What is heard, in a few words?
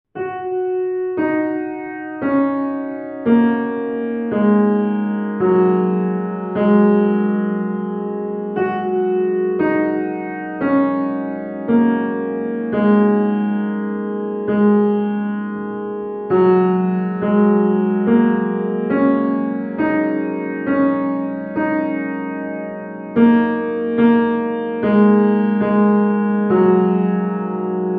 Glockenspiel